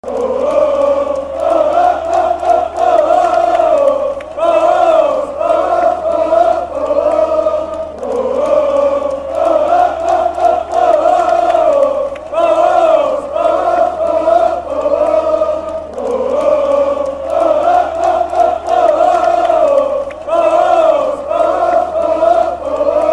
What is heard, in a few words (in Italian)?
La Marcia Trionfale Novara, cori curva nord